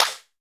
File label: SYN FX, PERCUSSION; AKAI S6000 CD-ROM - Volume 5